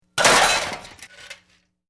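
Metal Medium Impact Settle, Noisy metal junk impact, fall and settle; Tools or metal parts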